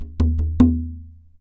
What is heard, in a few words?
DARABUKA; WorldSounds